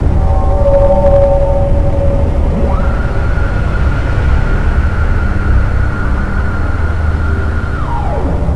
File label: vision extreme